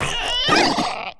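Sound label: creature woolamander attack heavy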